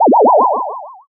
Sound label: teleport